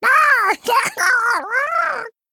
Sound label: Male Murloc